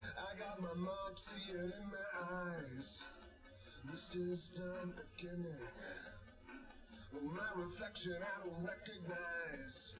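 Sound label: test with drums live rec; SiSEC11; underdetermined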